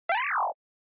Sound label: Motion Slide Mid Low